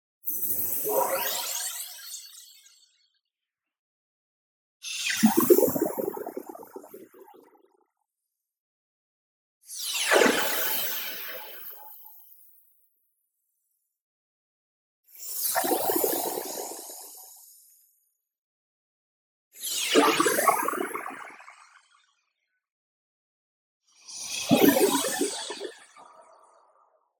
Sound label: shimmer, cloud appear high; boom library; Anime Essentials